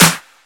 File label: hand clap sound e key; percussion; single hits one shots